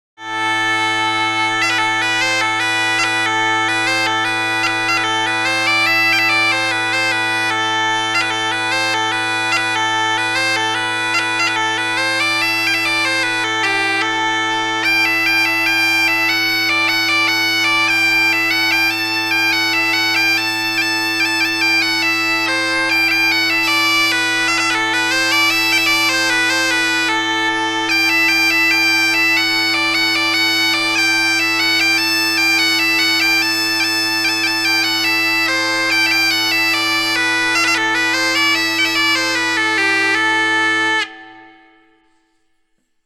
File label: Sack horn, dudelsack